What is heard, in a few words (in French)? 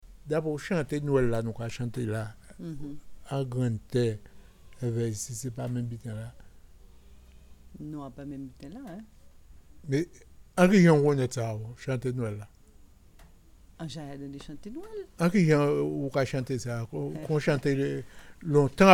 Chante nwel chant; Entraine par des amis; Une interview du programme de collecte de la mémoire orale des musiques en Guadeloupe